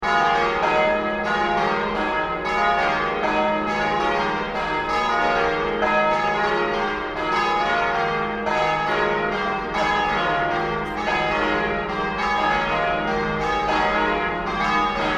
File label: Salute Cacaphony, Venice